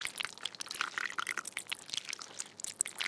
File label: bleeding; Original TK Sounds